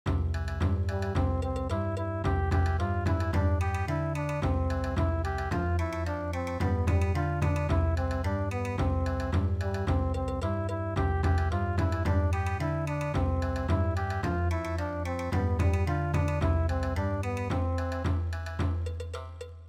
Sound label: ciranda cirandinha Bon Odori